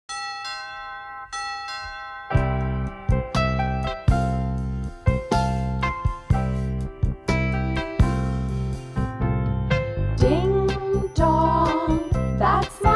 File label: Ding Dong